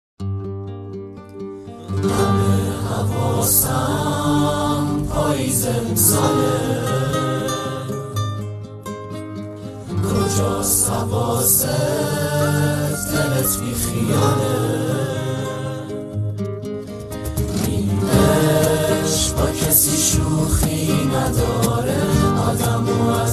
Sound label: Guitar